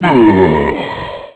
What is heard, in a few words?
pain; zombie plague